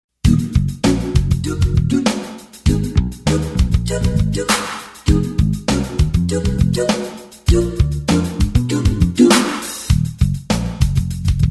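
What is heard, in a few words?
errant elephant instrumental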